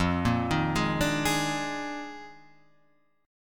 Eb Major 7th Suspended 2nd Suspended 4th F

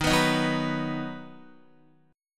Eb Minor Sharp 5th, Guitar, Ebm#5 chord; strummed; JGuitar

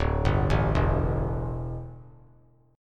D Suspended 2nd Suspended 4th D down; arpeggiated

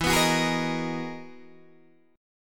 E-Major Sharp 11th E, Guitar DADGAD

